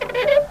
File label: drawer slide; Pats Virtual Office; PatsUniverse2.2